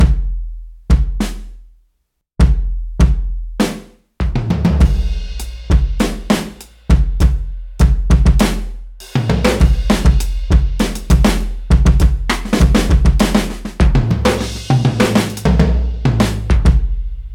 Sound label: Drum Solo